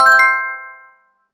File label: sound correct